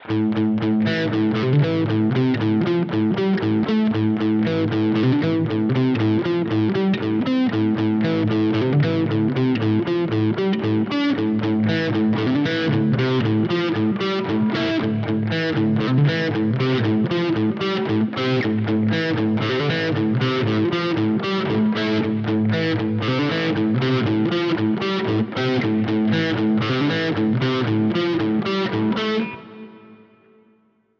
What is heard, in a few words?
Yamaha THR30 II Classic Hi Gain